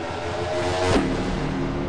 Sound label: autobahn; Sound FX Real Life